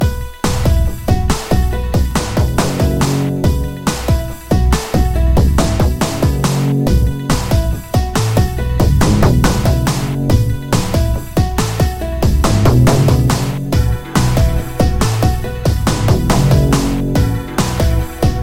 techno morning alarm ringtone

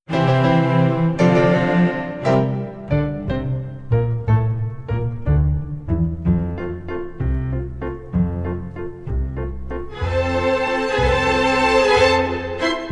waltz medley ringtone

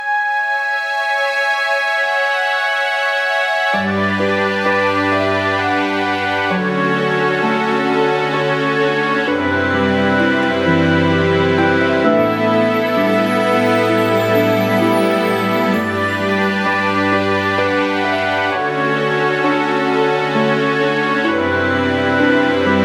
inside fantasy

